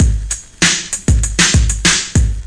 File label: 97 bpm rap, drum loops and breaks